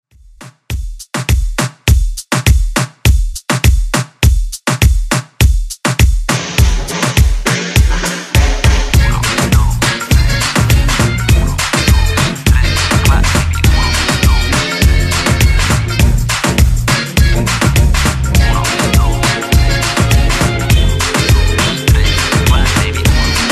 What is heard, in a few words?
20s Moombah Re Drum English